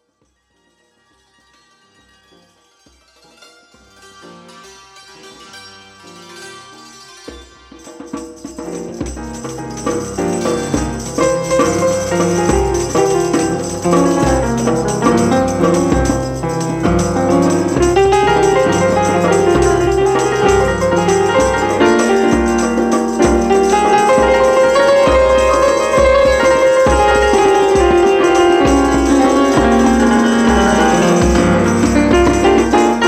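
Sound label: Piano, Santur and Drum Interlude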